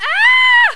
aaaahh!

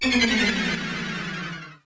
GHOST, creepy sounds

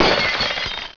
breaking

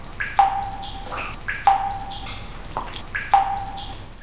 DRIPS

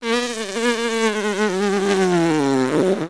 FLY BUZZ

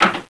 rattling

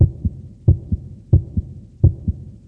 HEART; Heartbeat

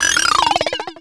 BONES

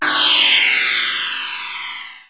ZING

